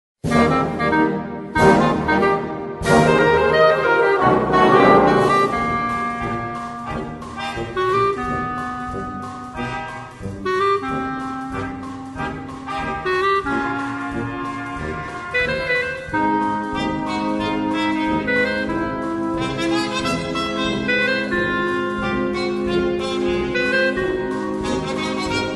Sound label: jazz band, clarinet